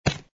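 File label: footstep stone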